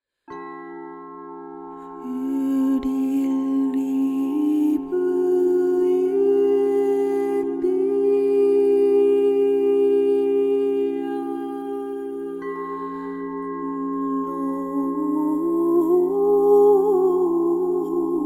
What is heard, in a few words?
CRYSTAL PRAYER Sound; Peter